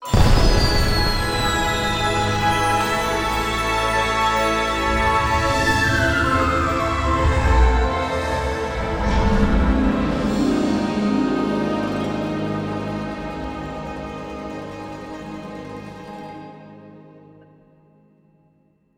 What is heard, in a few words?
bonus Win; netent